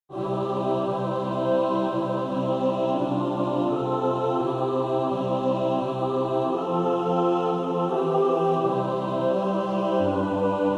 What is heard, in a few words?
Whosoever Will May Come mixed